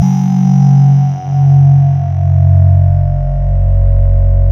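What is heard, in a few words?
synth Scanner; Best Service Gigapack 1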